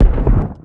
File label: Rock Walk & Run; Seer; GamigoNA